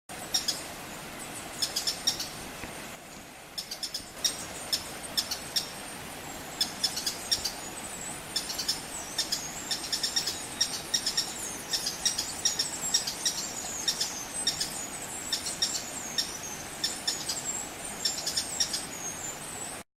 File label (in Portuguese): Canto do trepamusgos barbablanca panamenho